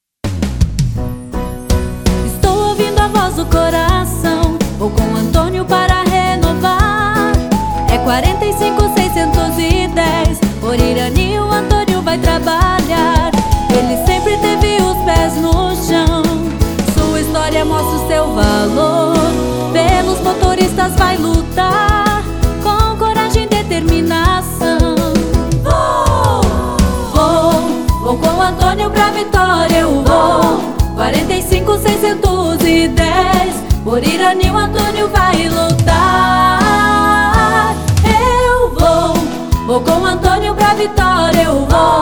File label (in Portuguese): Jingle Renovacao; Antonio Irani 45610